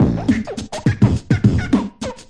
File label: beatbox happy squeal, 105 bpm; 101 to 110 bpm